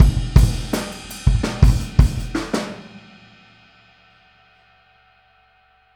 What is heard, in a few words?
Boogaloo Outro Kick, drums, 82 BPM